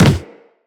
Run Wood, footsteps; Operator

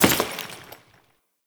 Follie Enemy Step; Warframe

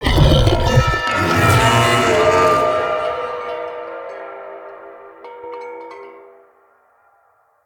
Corrupted Taunt Scream; Vocals; Bromius; Animals

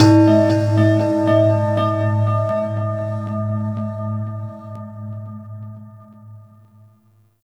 Temple Low Bells, Corpus Ship ambience; Temple One

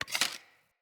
Hunter Bow Ranged Arrow Reload Grab; Weapons; Player; Bows; EnvoyHunterBowRangedMelee

